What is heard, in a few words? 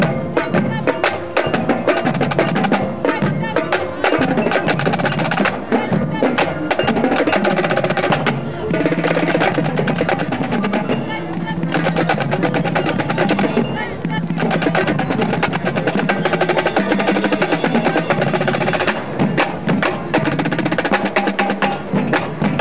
rumba, drums